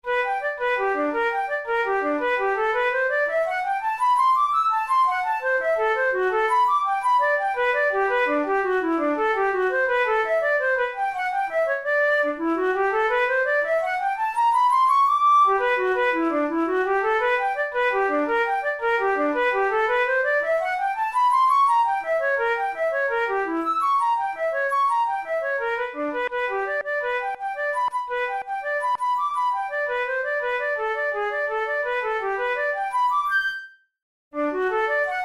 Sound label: finger exercise, flute; kohler op66